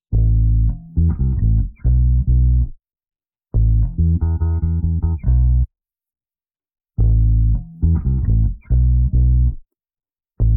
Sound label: music home base